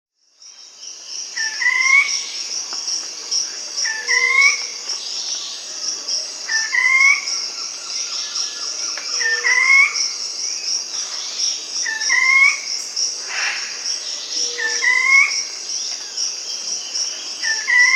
Ruddy Foliage-gleaner (Automolus rubiginosus), Bolivia; S. America